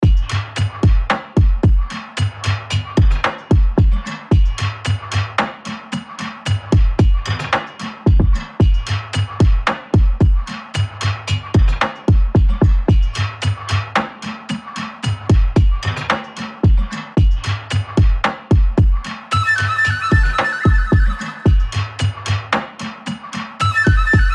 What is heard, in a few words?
Frequencies from Earth rhythmic, space